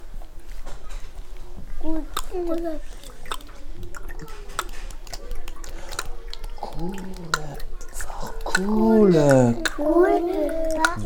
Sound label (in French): pluie, Comptine et claquement de langue pour la pluie avec les PS et MS; Gouttes de pluie en PS-MS – janvier 2026